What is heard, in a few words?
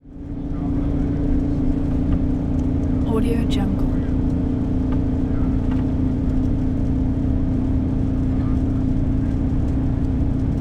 fan run